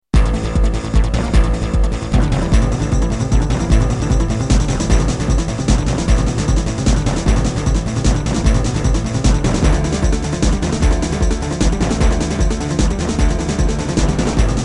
Music Boss

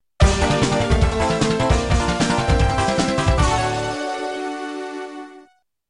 Music stage complete

